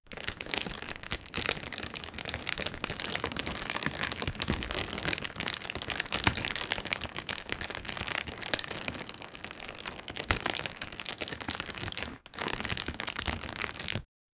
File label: generate a firm sharp rus, plastic